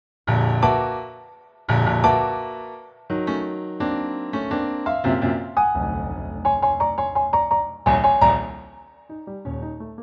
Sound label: Falling Rocks; Nobuyoshi Tanaka; Preludes